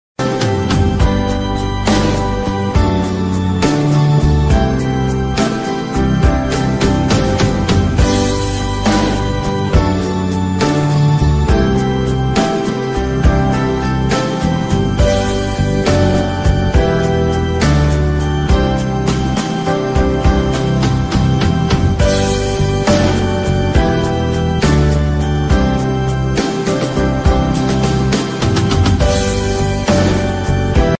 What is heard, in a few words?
instrumental